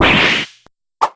cane hurt

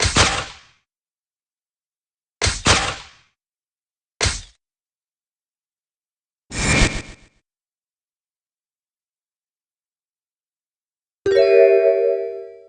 transform; roylott

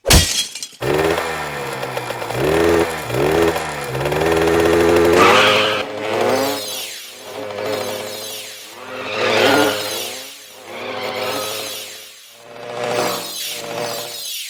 ride; cosmos

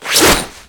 bow

